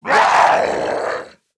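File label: die, zombie male; nmr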